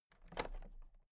toolbox latches open very soft

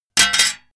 metal plate thin drop

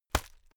hit plastic fleshy

